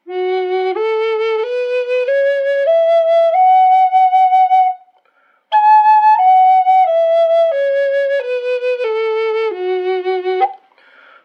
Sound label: F-sharp spalted maple dry, flutes; spalted maple burl